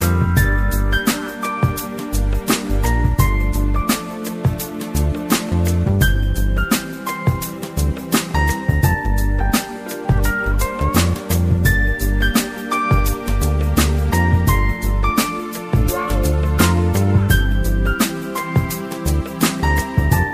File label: Romantic Effect